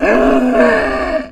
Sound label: creature pain; theodyssey